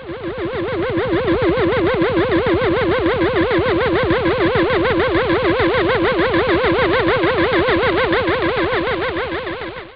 UFO fly by

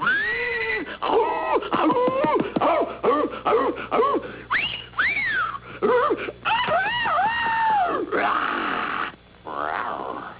CAT CALL

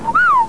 WHISTLE

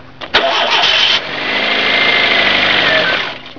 Start

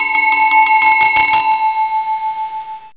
WIN

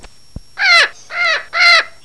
BIRD

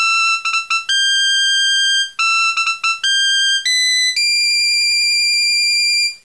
kaval

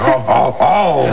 ARUGH

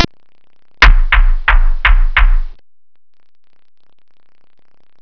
BEAT

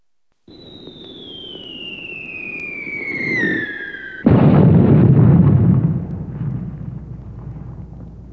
BOMB

